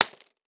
Impact Mallet Hit Ceramic Tile

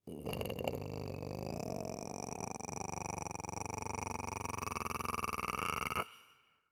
Sci-Fi Alien Hiss; Science Fiction

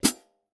Cartoon Drum High Hat Close

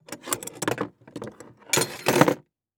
Industry Tool Box Remove Wrench

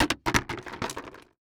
Impact Plastic Bucket Empty Dropped On Concrete

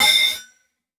Flash Beep Mechanical; Multimedia Internet CD-Rom Flash

